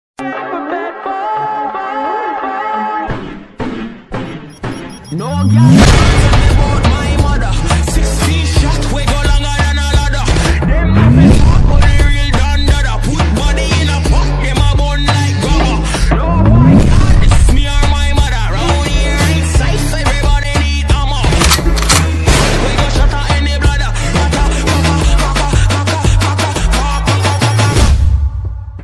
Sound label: korean ringtone